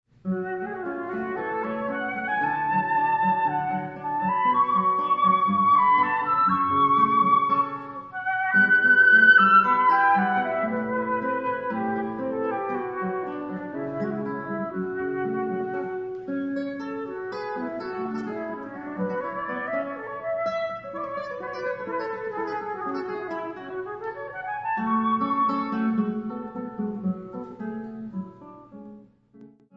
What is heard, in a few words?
Flute Guitar